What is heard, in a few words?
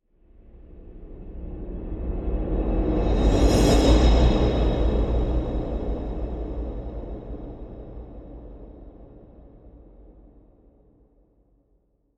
Low Swoosh